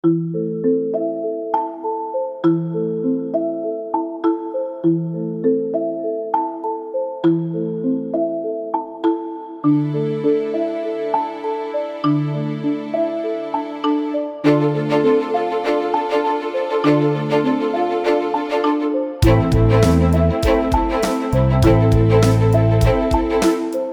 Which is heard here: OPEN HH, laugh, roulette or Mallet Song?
Mallet Song